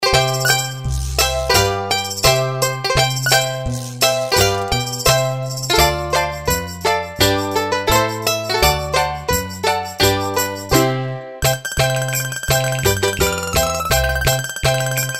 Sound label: Balalaika, Asian Ethnic Sounds